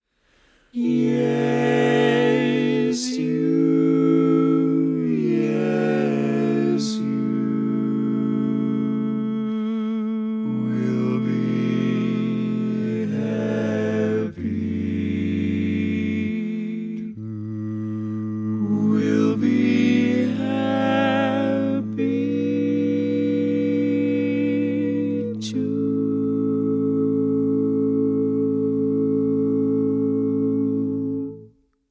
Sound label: All Parts, Barbershop Tags